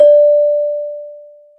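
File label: CELESTA D5, percussion; AKAI S-Series CD-ROM Sound Library VOL-8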